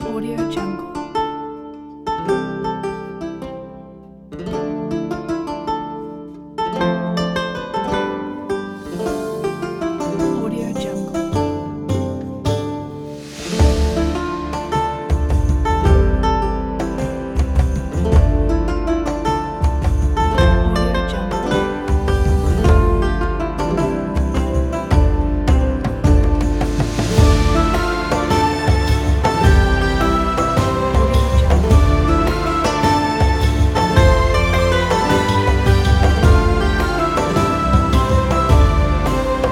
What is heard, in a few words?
medieval soundtrack; worldnoise